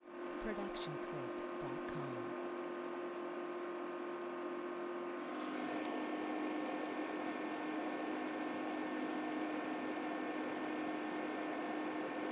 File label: printer on; soundscrate